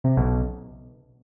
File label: incorrect chime